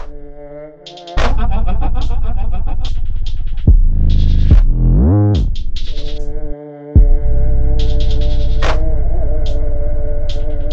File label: uk drill